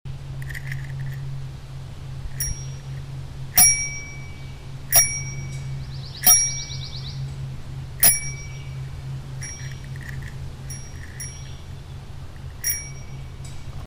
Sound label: Bass